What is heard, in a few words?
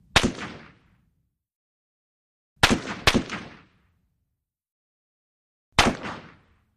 Russian SKS Single, sks 7x6